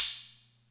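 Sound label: Atlantex Music Percussion Computer hi-hat closed; Atlantex MPC-1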